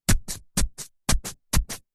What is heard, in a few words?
household garden wooden sharp gardening tool stabs into grass soil; zapsplat